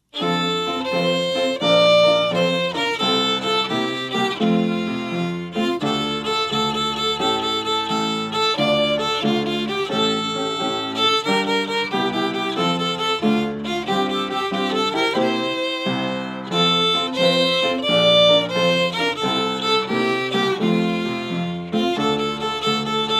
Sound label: Yo Ho the Pirates Song Violin Duet